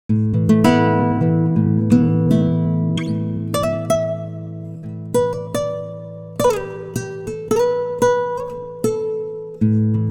timestretch guitar; digidesign pro tools ts

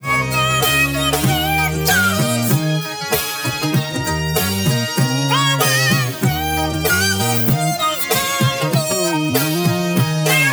Serato Pitch 'n Time LE pitch shift -2

